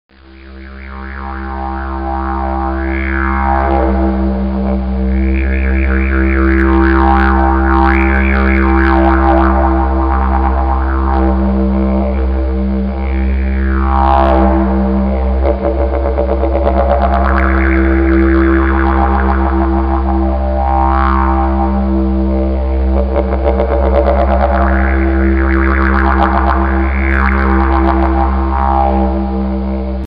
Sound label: harmonics, didge